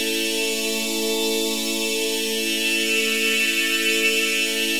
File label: Pad Loop A, shimmer and sparkle